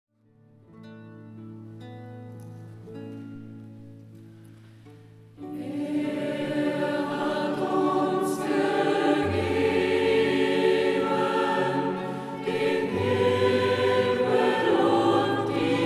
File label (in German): Sonnengesang